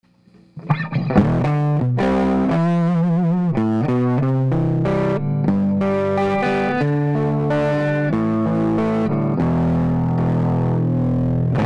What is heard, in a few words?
Retro, Amps